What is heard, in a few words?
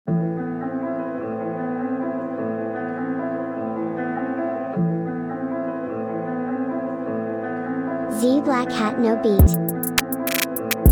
detroid type beat